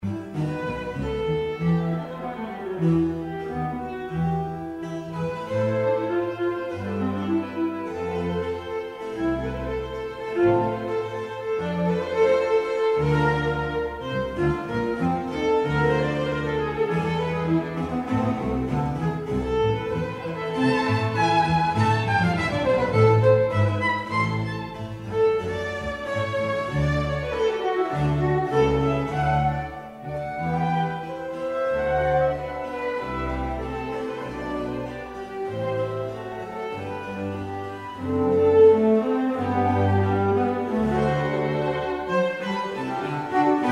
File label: Ensemble Telemann Viola Concerto